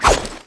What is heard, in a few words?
attack; Eft; Devilous